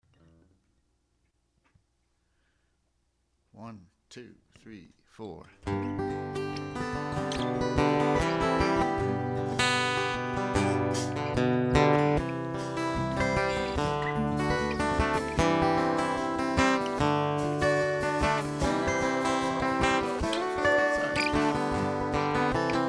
Interlude

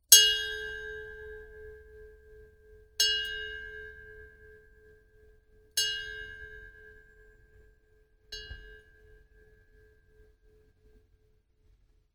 Freedom Bell

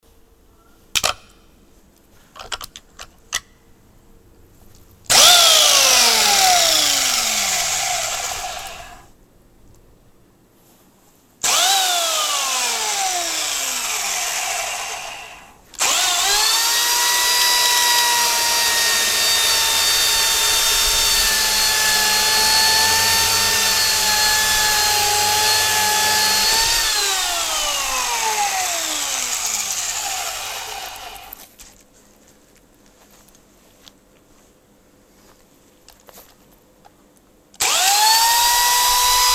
Chainsaw Mix

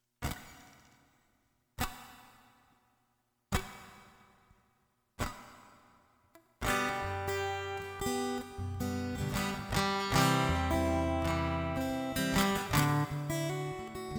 Instrumental

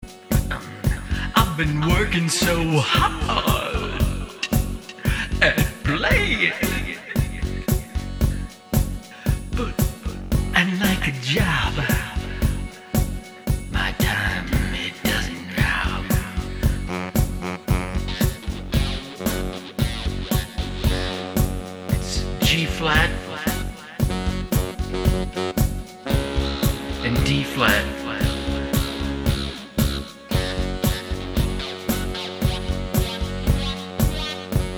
Soundcheck

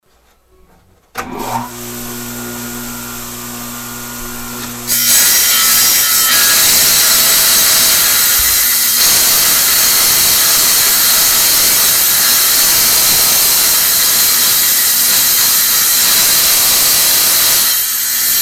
Wet Saw

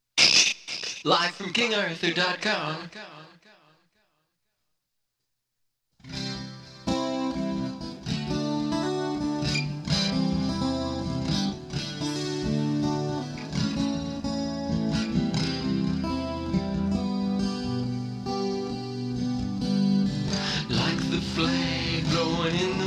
Soundcheck; Airtight

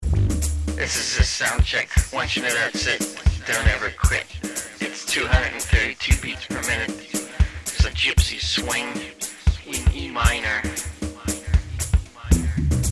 Roma Gypsy Swing Thing Soundcheck

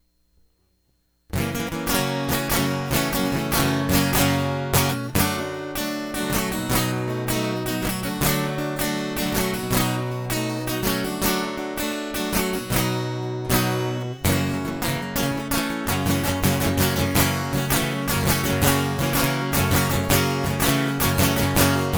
Guitar Loop; hyper terrestrial

